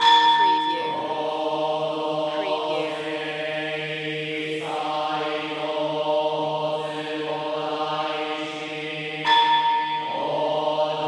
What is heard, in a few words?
monk Buddhist ceremony drone chant males bells Tokyo Japan